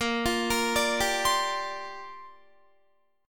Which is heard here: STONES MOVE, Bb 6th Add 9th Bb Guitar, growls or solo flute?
Bb 6th Add 9th Bb Guitar